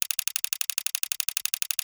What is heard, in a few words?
count loop, big win; games; Corrida Romance; wazdan